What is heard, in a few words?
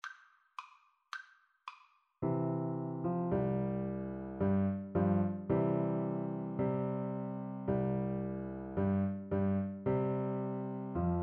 piano duet